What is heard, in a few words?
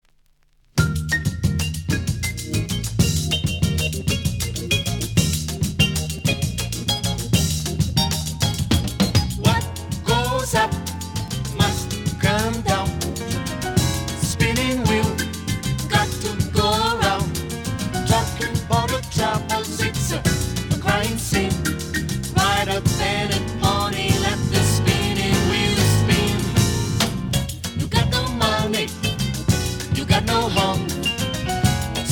BOSSA